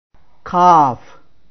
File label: calf